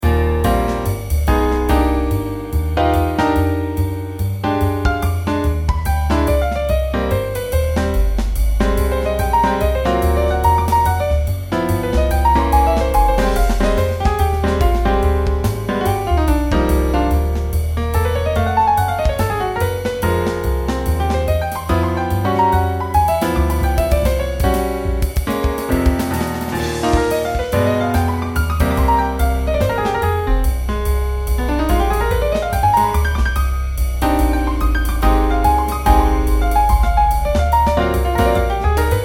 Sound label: Trio